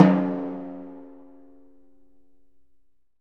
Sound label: DRM, Orchestral Perc; Roland LCDP03